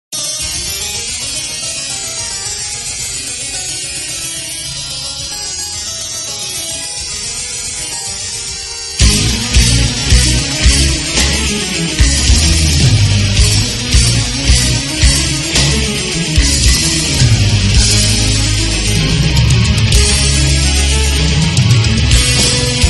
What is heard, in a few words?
Instrumental; Metal